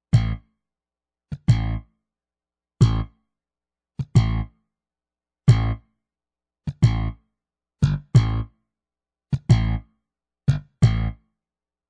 Bass Solo; Surround Session

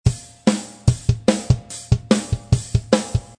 Dirty Rock, Heavy Modern Funk & Rock; Loopology

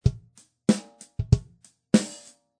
Smack Funk Drm; Funk & Rock; Loopology; Get Dirty

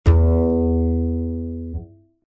Bow Fid E oneshot, Rockabilly

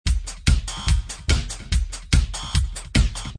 Pump Beat; Loopology; Pumpin; Techno & Dance

